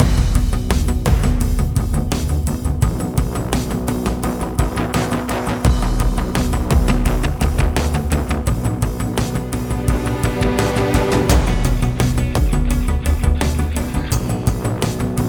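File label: Music Ambient, Ambient Pre Race 85bpm; Forza Motorsport 6 Apex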